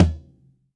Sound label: Tom, drum